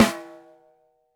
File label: Snare, Roland TD-20